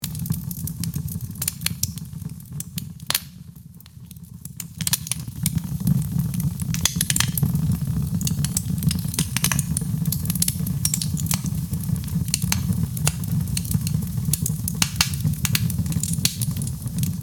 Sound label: fire